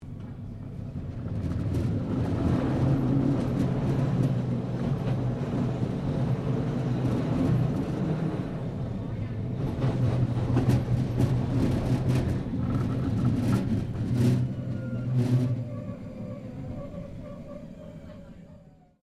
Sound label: Tram Melbourne W Class Interior Stopping; Melbourne Trams; Streetcars; Vehicles